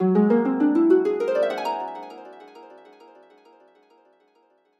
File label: Harp Ascend